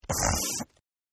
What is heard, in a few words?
Sniff; Sickness Sounds; Partners In Rhyme